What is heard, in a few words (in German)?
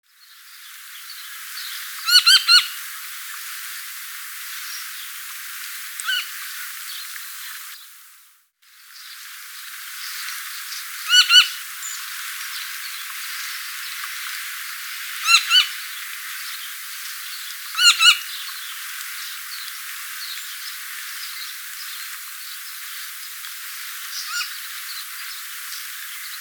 Pirol Oriolus oriolus - Ruf; Bergmann